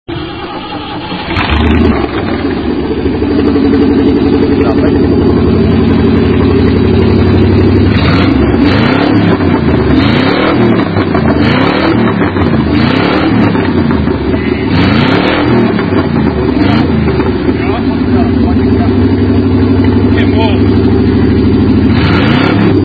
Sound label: rough, v8 micra; magicspanner